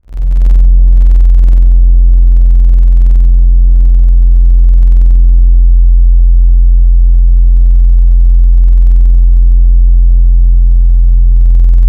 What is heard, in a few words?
Aussie Flute; Synthetic's Serum Collection; Echo Sound Works Sphere